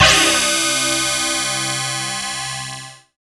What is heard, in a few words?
SYN, Roland, SYN DIGITAL; Sound & Vision - Gigapack I CD 2 (Roland)